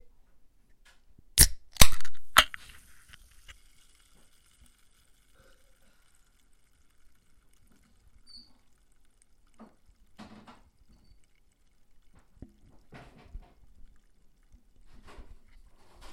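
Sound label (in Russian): открываю сельтерскую. удовлетворяющий треск за которым следуют пузырьки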